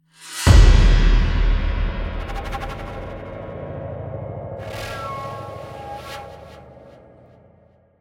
Med Robot High Outro; Med Robot Group2 High Outro

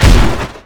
Bomb Explode Sound; Jolly Battle; Android

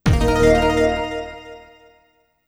Coin; project g

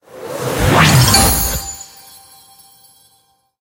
Ui General high score

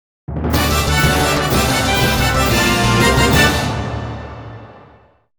Victory Jingle; Busou Shinki Battle Masters; Title Match

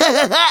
Emote Laugh; Target Hungryfrogs

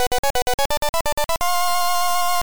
Item Get; Gensou Tairiku Auleria; PC Engine Super CD-ROM2

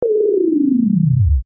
gameover; meteor crush vr

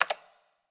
Click

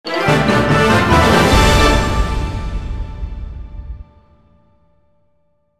Duel Win

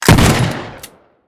shotgun

